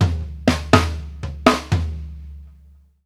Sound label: TOM, drumworks fills; Sampleheads; New York City Drumworks VOL-1; KD FILLS 120